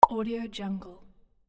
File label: pop; audioheart